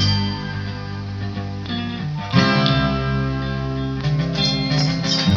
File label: guitar, funky drum loops